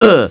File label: grunt; beavis and butt head